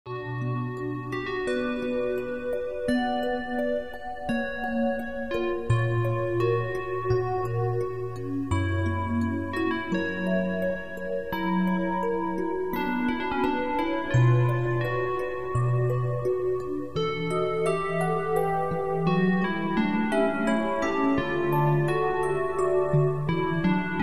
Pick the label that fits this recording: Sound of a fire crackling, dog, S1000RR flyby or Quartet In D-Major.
Quartet In D-Major